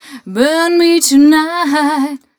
f mel d, fem Vocals

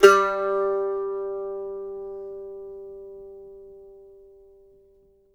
MANDO NOTES; Sampleheads; Whole Lotta Country 1 & 2

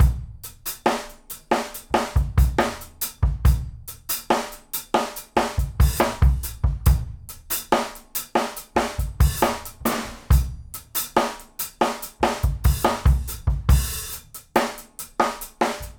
GROOVE, 60-85bpm; E-MU Producer Series Vol. 8 – Platinum Phatt

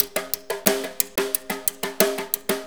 90 FLOOR, 90 PERC

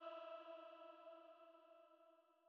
Female Pad E3

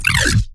glitch stab; Virtual Riot; Glitch Stabs

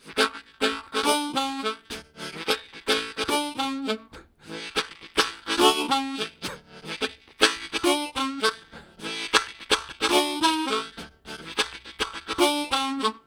Kicks, Harmonica Riffs; Zero G

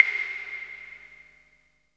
CLAVE; East West - Phat & Phunky CD2; 90's Sample CDs

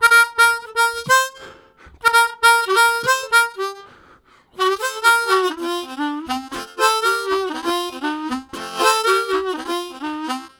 Repeating, Harmonica Riffs; Zero G

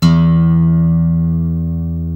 GUI Ac. E2, GUIT AC; Best Service Gigapack 2

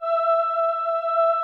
VP CHOIR F4, VOCODER; Greytsounds VOL-1

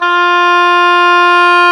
oboe, Oboe Brth

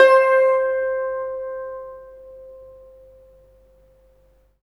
SITUR C5; Spectrasonics; Heart of Asia

